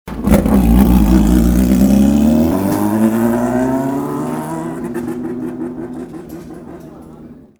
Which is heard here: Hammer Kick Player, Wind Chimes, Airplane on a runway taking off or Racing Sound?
Racing Sound